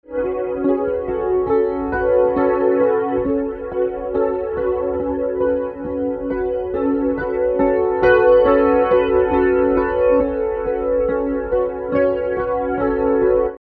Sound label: ensemble; kilohearts